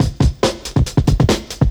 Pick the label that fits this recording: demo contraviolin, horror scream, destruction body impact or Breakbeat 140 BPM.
Breakbeat 140 BPM